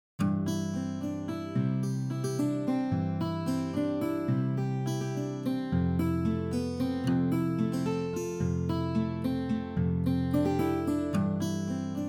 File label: Anomaly Acoustic